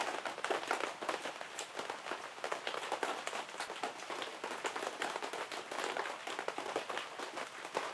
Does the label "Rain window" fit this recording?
Yes